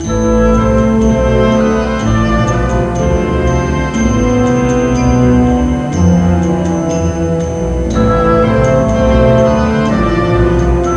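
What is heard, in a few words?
woodwind; STARGATE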